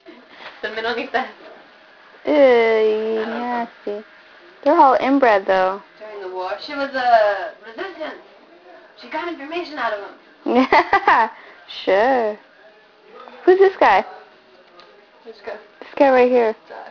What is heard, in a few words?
rave